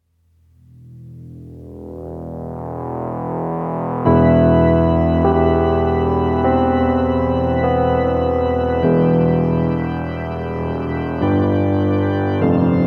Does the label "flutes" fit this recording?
Yes